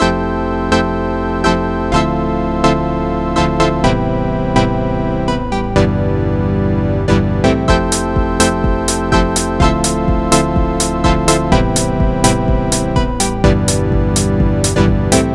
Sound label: music; cheesy-underwater-adventure; canYouDigIt